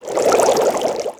smoke bubbles

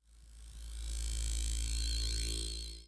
FLARE

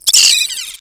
hurt; mahlendur

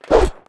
woosh

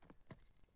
split wood

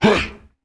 orc hurt

